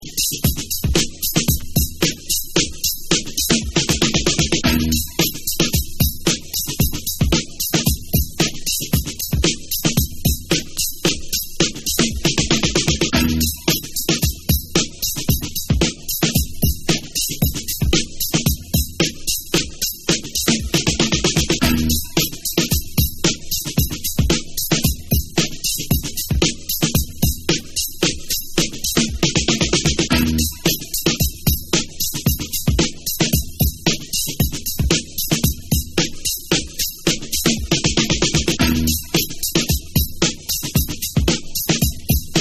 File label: RICH DRUM BEAT Drum